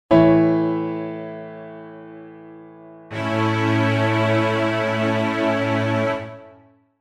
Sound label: a major chord